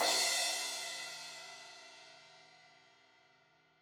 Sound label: Crash 16in edge, Cymbals